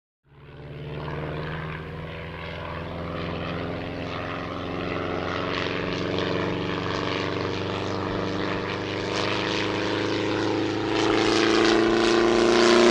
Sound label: Prop Plane Dive